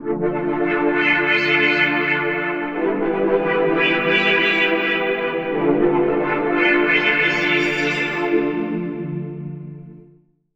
Keys, Drum n Bass; KJ Sawka - Drum n Bass & Beyond; Synth & Melody

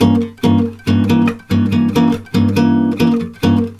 G DIM GTR, Brazil Bossa; USB Soundscan; AKAI

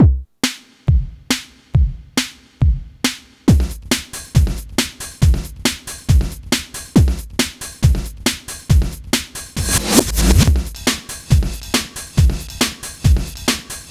138, drumloops, Hardcore Breakbeat; Big Fish Audio; Hardcore Breakbeat Action

